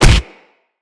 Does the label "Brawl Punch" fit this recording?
Yes